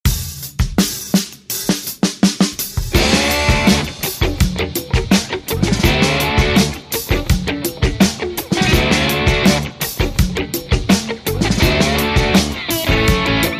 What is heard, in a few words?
country rock cue